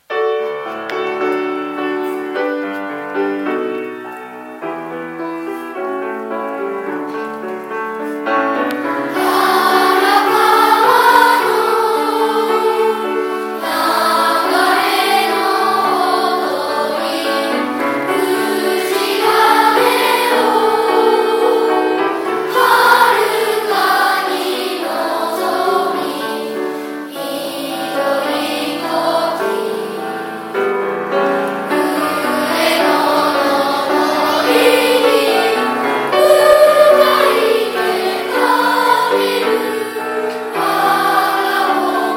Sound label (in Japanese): 校歌; 荒川区立第二峡田小学校 校歌